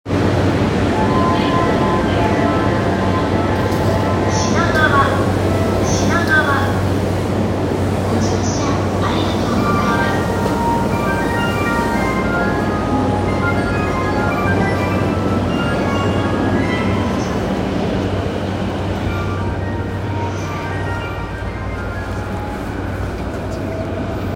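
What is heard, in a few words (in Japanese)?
山手線品川 clockwise, train sounds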